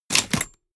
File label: typewriter